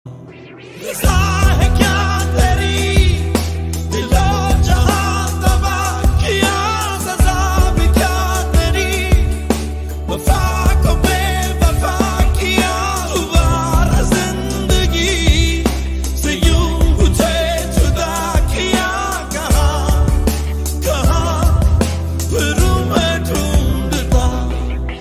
sad ringtone; jism 2 movie

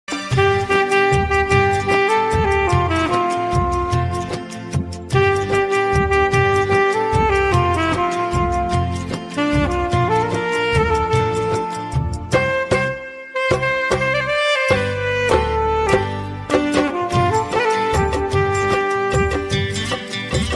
saxophone ringtone

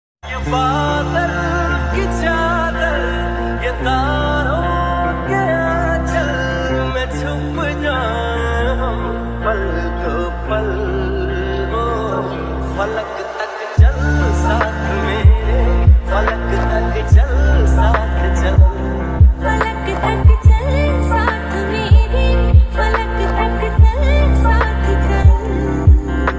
lofi; dulquer salmaan; cute couples

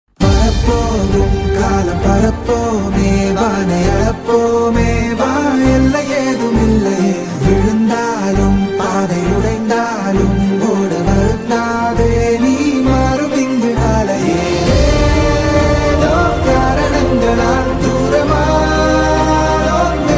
bgm ringtone tamil; Singapore Saloon

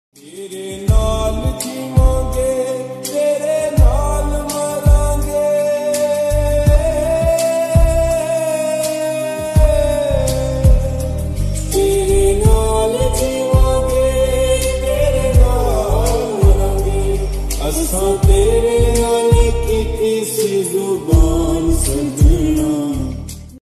love ringtone sad ringtone

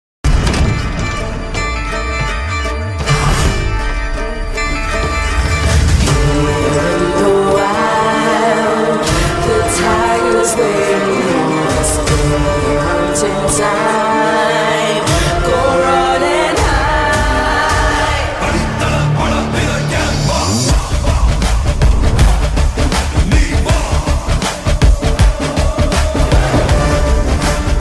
mass bgm ringtone, Malayalam; kaduva; prithviraj; intro

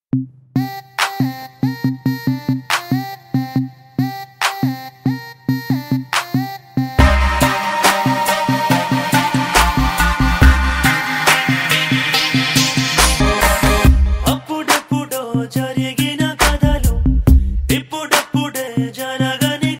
telugu movie songs song bgm ringtone; oye; sehari

